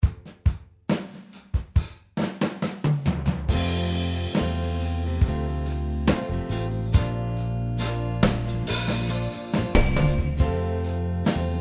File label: slide solo backing